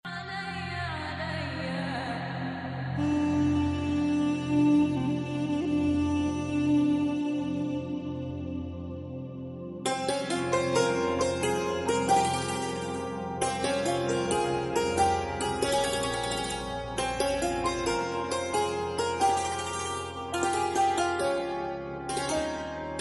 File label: Fashion Guitar